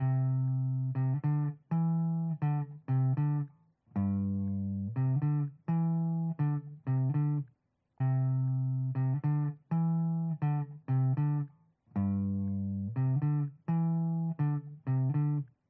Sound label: bassline